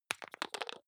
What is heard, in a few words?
hygiene small screw cup open medium; haus-garten; badezimmer